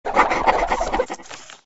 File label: writeoff pen only